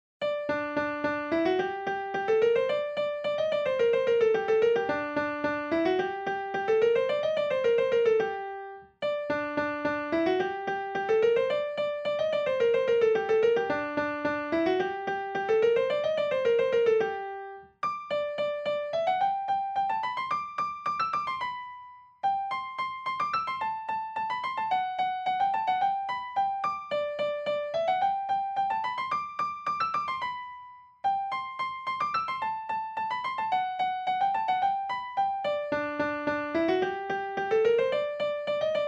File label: Anglaise Per Piano; Frammento